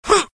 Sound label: hit; Adventure Time - Break The Worm